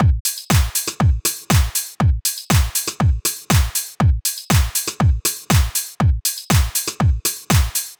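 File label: Drum loop, 120bpm D; Zero-G; Nu Disco; Construction Kits; Happy People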